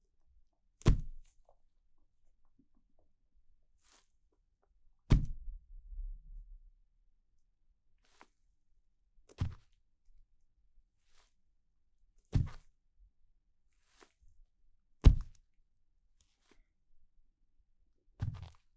Light Impact On Concrete

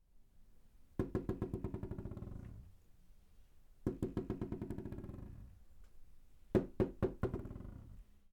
Rubber Ball Bouncing

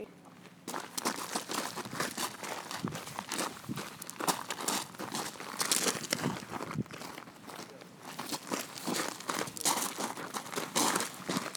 Walking On Rocks

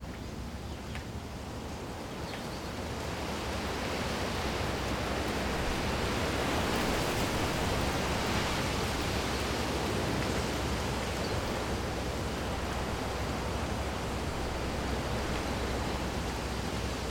Wind Gust Garden

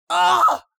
Male Scream

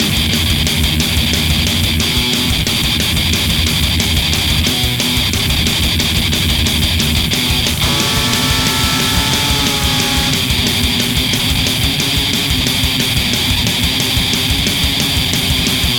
Metal Bomber Loop

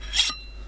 sword

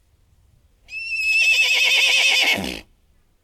Neigh

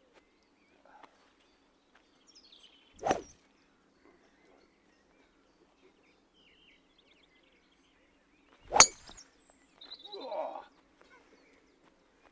Golf tee off

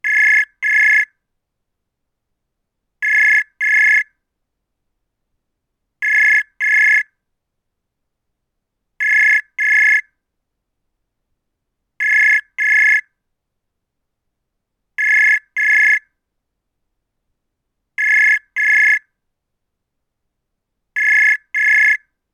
Phone Rings Type 1

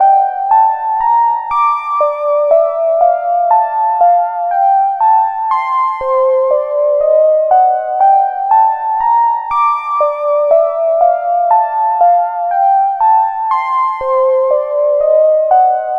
Matrix 1000 Synth Loop

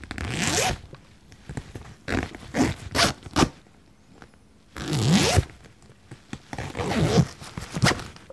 Bag Zip

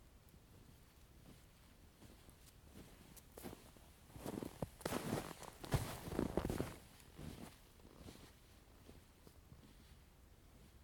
Walking In Snow

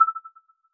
Radar

